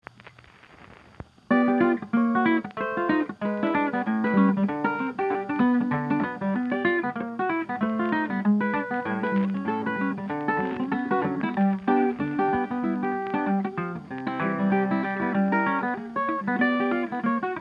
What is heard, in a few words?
Chromatic Rag